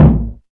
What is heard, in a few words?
nice bass drum A key, bass drum single shot tuned to the A note; kick drum samples